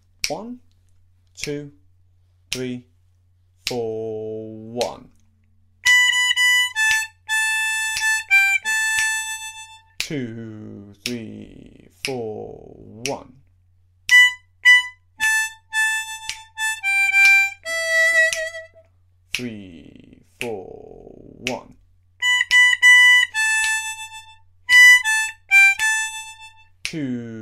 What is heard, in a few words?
knocking harp